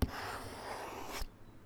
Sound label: Drawing with pencil and marker